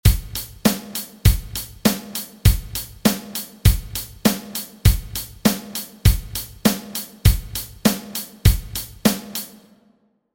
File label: illusion 100 bpm